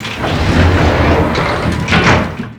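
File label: elevator door close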